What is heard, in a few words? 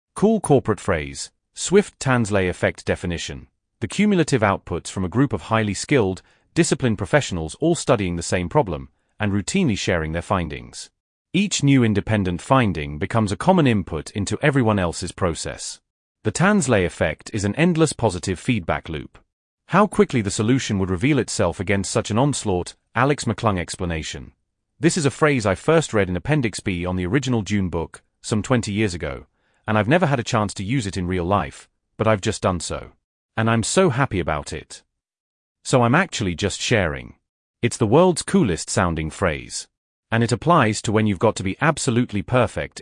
Cool Corporate Phrase